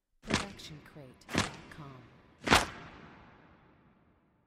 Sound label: designer breaker switches various